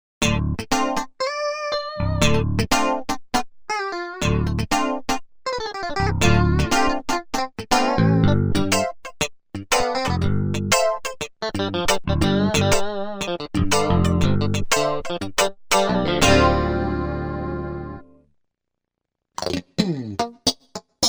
gtr

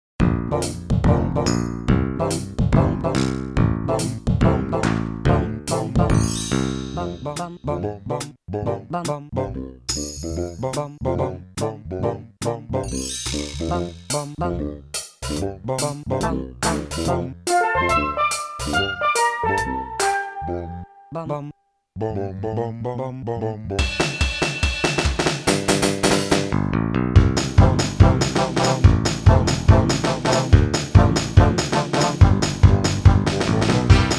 doowap

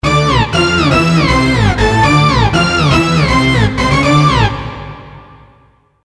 Brass hit

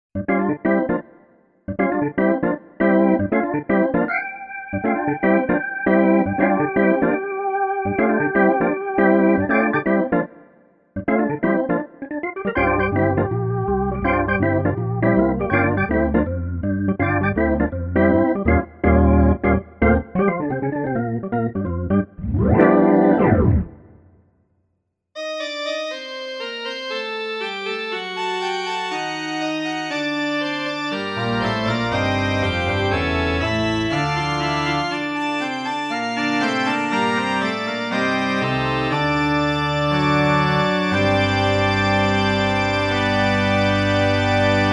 organ

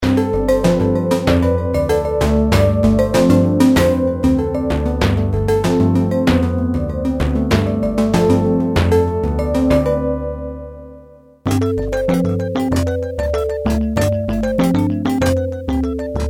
kawai k1 decay hybrid

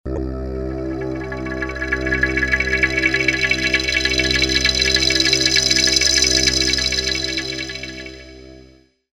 Ritual fx

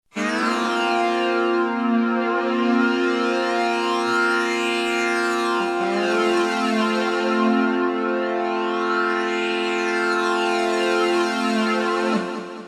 sweepy

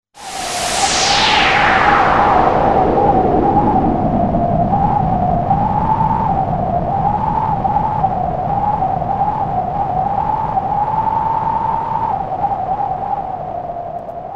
wind

